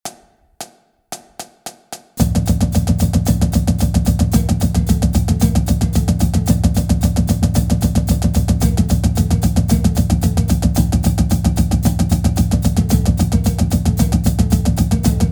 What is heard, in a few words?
Stick Control, drumline